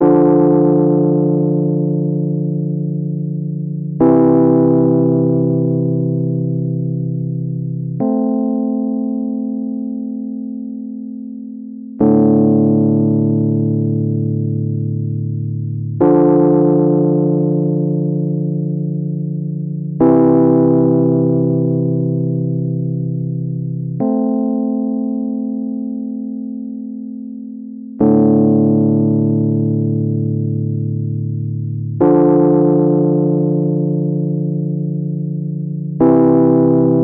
D120 Dystopiano dry

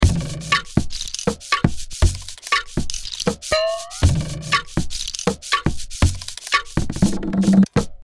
Beats

